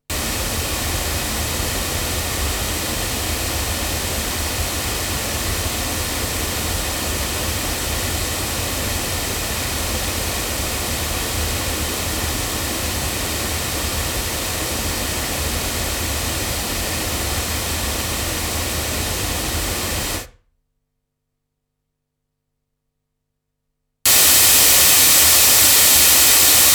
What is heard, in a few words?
Oktava MKL-5000 cardioid loudspeakers